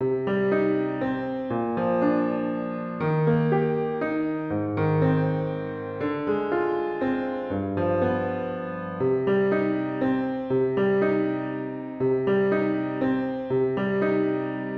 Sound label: we three kings piano